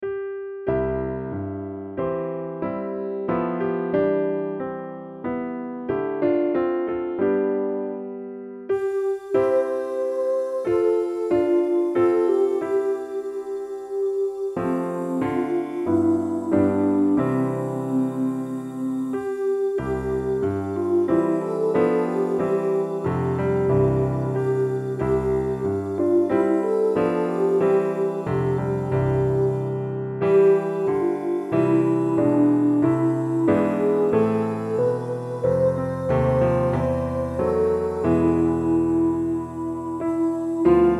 POWER Piano Study C LOW

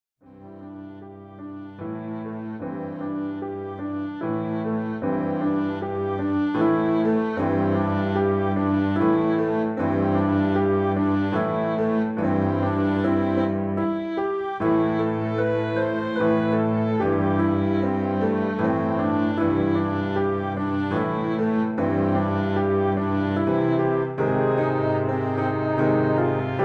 Low Acc

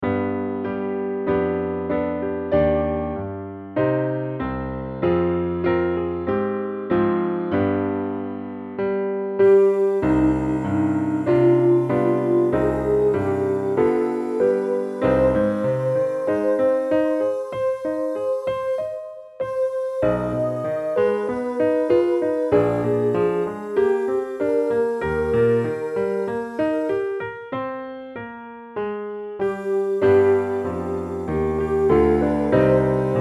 Piano Study Eb MED

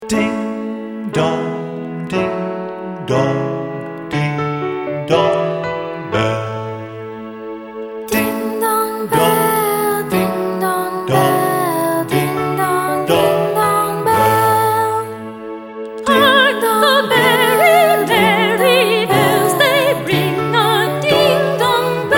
DING DONG BELL; for kids only

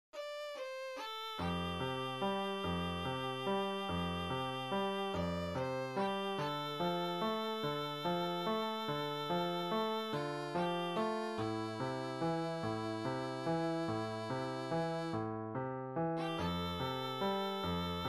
peace like a river med study VIOLIN

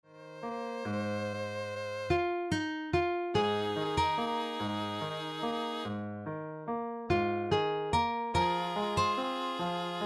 peace like a river high study VIOLIN

